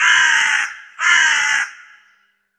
vorona karkaet osenniy golos

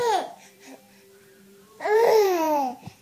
serebryanyie kapelki veselya, zvuki detskogo smeha

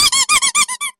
rezinovyiy nos pik, klouna

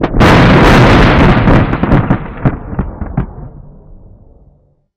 seriya molniy groza bushuet; zvuki buri

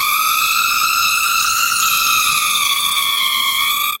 bormashina horror

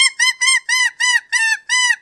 golos bez slov detskiy pozyivnoy; zvuki kuklyi

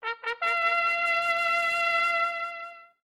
prizyiv na sbor, zvuki gorna

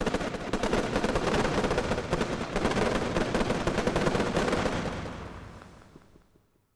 zavershenie feyerverka odnovremenno

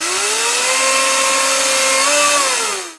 skladskaya mashina podyem karetki

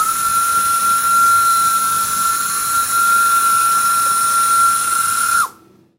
stomatologicheskiy kabinet atmosfera

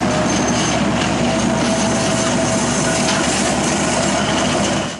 traki po doroge metallicheskiy zvon, zvuk voennoy tehniki

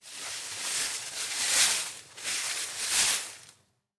listvu sobirayut hrust i shoroh, zvuki metlyi

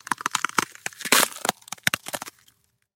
hrupkaya korka skrejet pod podoshvoy

